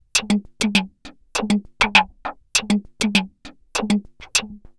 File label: reso gate, 100bpm loops; transx loops